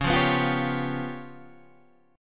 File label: C sharp 7th Flat 5th, Guitar, Standard; C#7b5; strummed; JGuitar